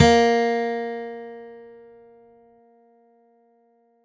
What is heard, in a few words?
Mallet Guitar Bb2; Multisamples; Ableton Live 12 Lite; Core Library